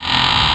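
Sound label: tree creak; lomax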